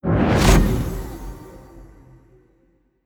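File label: illusion charge, magic; skyrim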